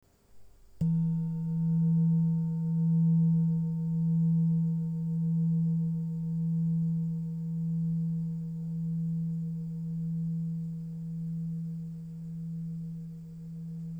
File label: E 164Hz; Orissa